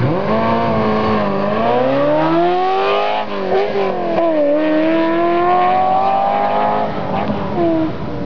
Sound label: acceleration